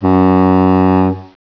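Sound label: foghorn; World Racing 2; XBOX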